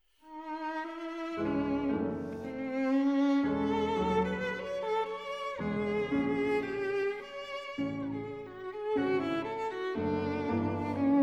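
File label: violin sonata; dohnanyi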